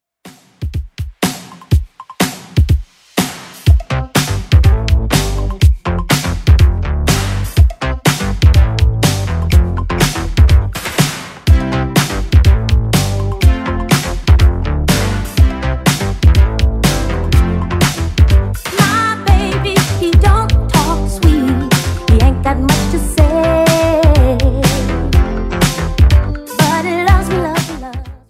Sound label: 80s Redrum 123 bpm Clean